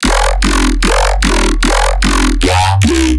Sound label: bass loop 150 F; revolt; Virtual Riot